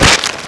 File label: Mesh Fence Collide; XBOX; Amped 2